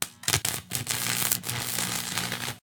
rain of sparks; battle

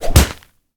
right jab; battle

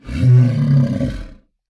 bear warn; chaosbear

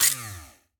submit; humanitycards

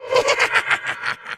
frightening laugh; battle

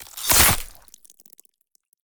draw blood; battle

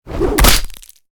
nose punch; battle

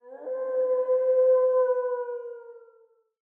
howl; battle

